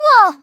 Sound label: Wah; Teto; SM64 CO-OP; Triple Baka Pack